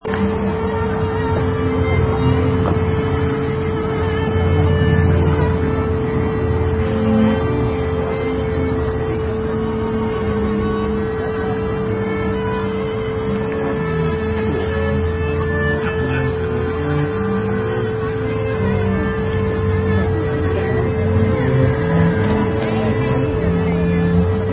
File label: Wedding Ceremony Background